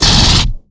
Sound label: SMART FIRE, weapons; svencoop; aliens